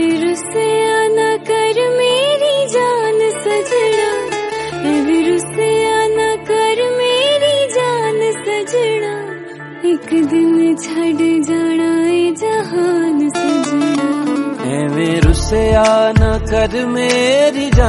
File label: lofi punjabi song